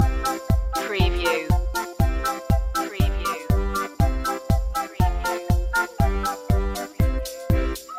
merry polka loop

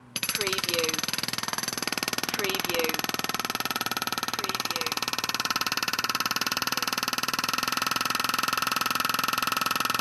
jackhammer

